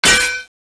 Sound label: knife block